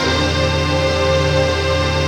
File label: RAVE PAD; Best Service; XX Large; Pads